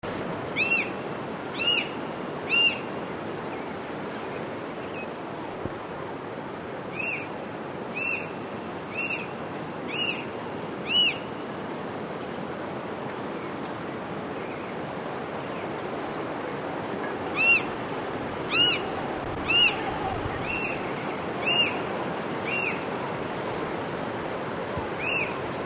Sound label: black chested buzzard eagle